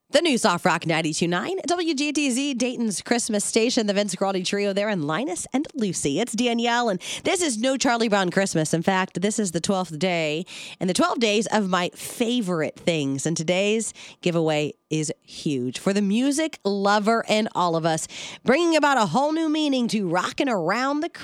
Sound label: GUITAR; HAUER MUSIC